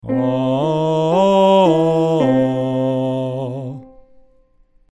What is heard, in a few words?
Arpeggio vowels